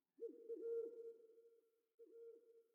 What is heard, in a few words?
sting owl; Rust